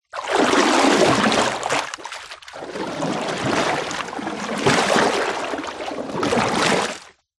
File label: nature water deep movement surface